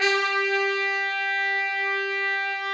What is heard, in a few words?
TRUMP